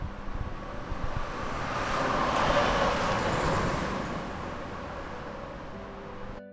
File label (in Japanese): 車 トラック 通過音